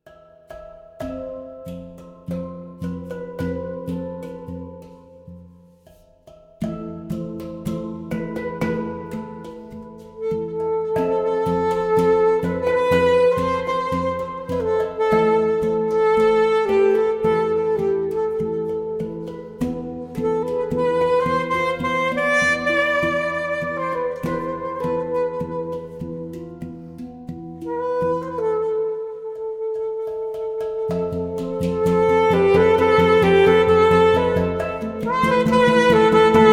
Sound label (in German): Saxofon & Hang